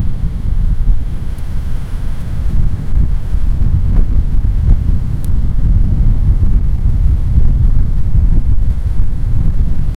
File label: mars environment, planet